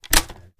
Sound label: raise first close, sh; gmod; wfoly plr sh charlie725